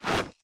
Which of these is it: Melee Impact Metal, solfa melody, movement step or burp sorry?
movement step